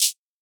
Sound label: hat; Anthemic